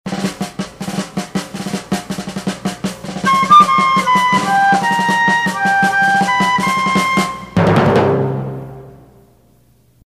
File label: Fife and Drums; PAMS Numbered Series; WABQ